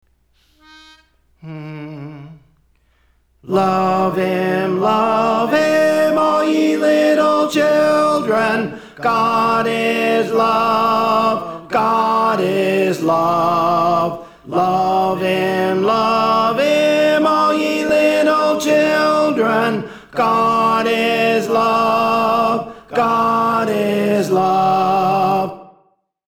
Tenor; Praise For The Lord Training

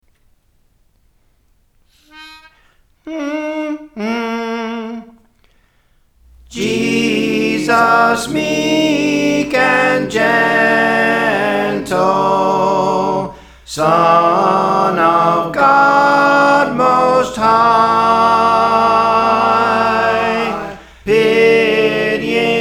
Soprano; Praise For The Lord Training; Jesus Meek and Gentle

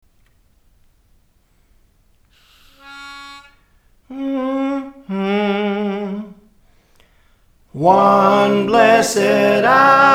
Soprano, Praise For The Lord Training; One Blessed Hour with Jesus

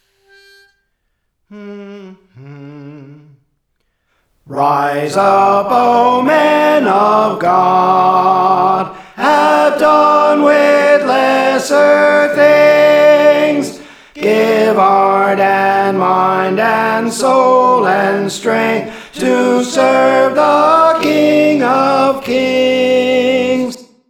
Tenor, Praise For The Lord Training

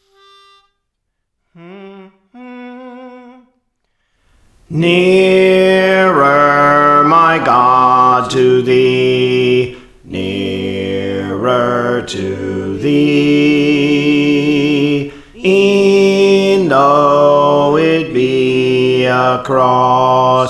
Bass, Songs; Praise For The Lord Training; Nearer My God to Thee

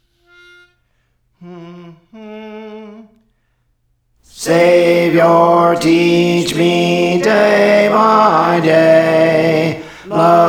Alto, Praise For The Lord Training

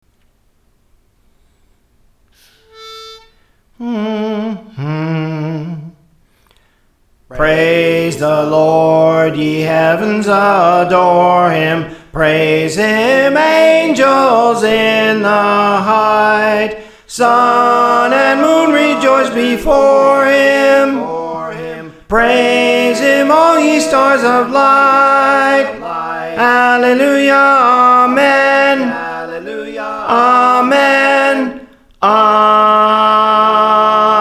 Soprano, Praise For The Lord Training

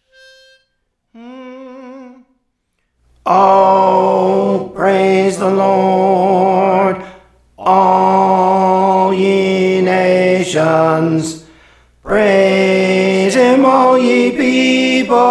Tenor, Songs; Praise For The Lord Training

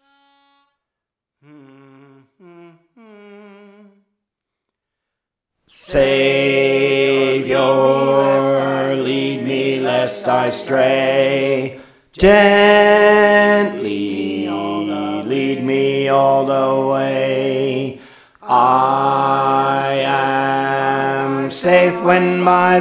Bass; Praise For The Lord Training

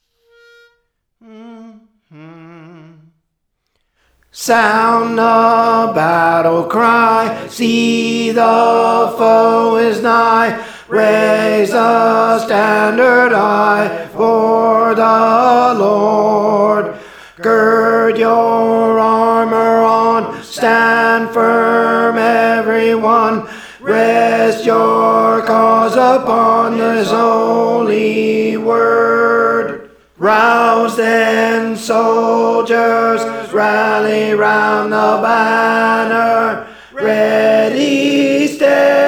Tenor; Praise For The Lord Training

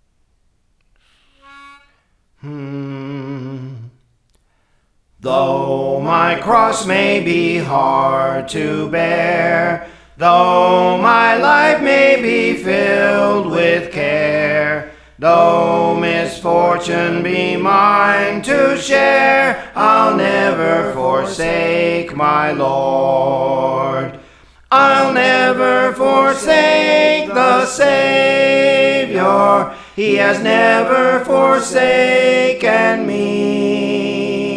Soprano; Praise For The Lord Training

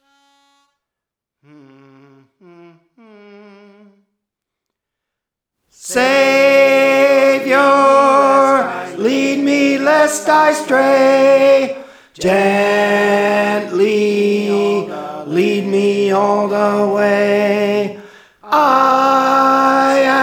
Tenor; Praise For The Lord Training

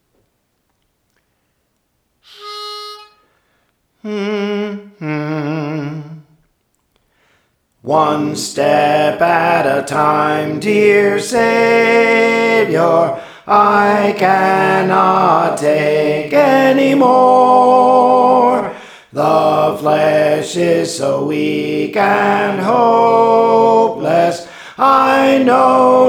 Soprano; Praise For The Lord Training

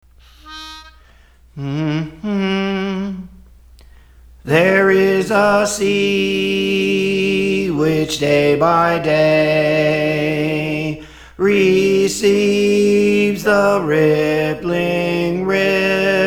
Soprano; Praise For The Lord Training

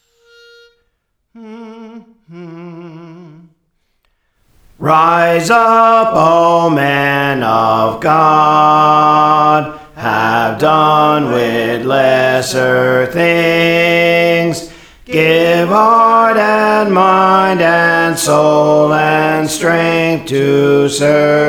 Bass; Praise For The Lord Training

